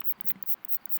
song sduplocantans, katydids, neduba

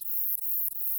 song slongiplutea, katydids, neduba

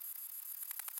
song soblongata, katydids, neduba

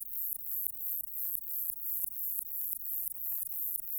song sambagiosa, katydids, neduba

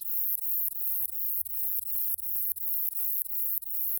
song, katydids, neduba; katydids neduba longiplutea

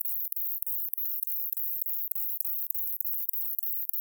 song, katydids; neduba sequoia

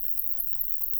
song propsti, katydids, neduba

